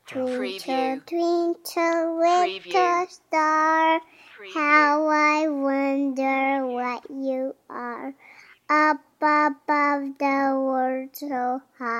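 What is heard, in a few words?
Kid Sings Twinkle Star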